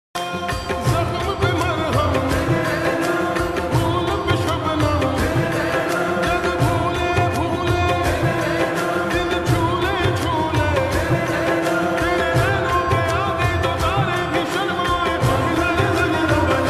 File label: love ringtone romantic ringtone